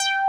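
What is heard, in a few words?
JX MOOG BASS; Pro-Rec - Synthsonic; TRINITY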